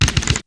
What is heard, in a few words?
button crunches